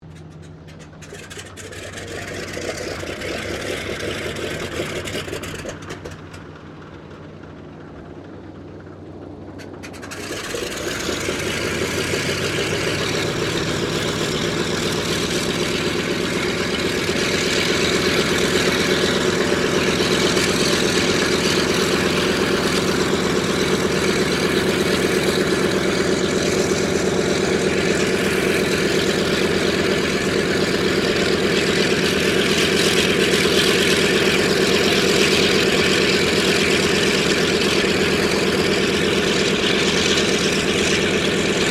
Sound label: AIRCRAFT DOUGLAS DC3 STARTUP; Airplanes; Vehicles; Qantas 1942 DC3; Aircraft Collection